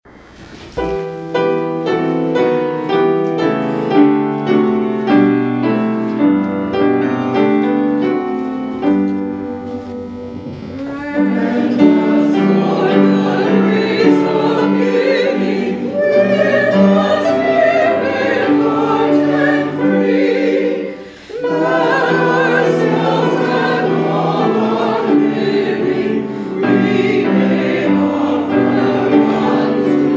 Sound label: Hymn; Grant Us, Lord, the Grace